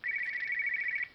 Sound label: motorola razr v3 ringtones attention